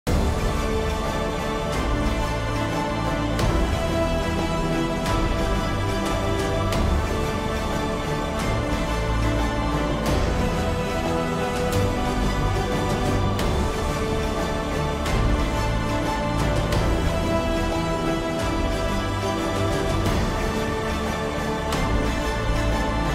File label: Music